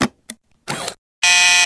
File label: central computer; kids-tetris; Lab